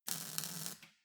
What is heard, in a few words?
weld sizzle short